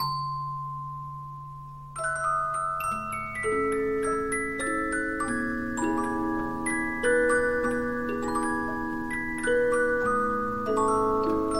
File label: Polyphon, 15inch disc musicbox